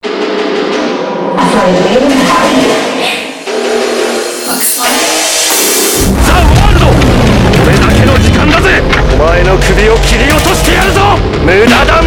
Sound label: phonk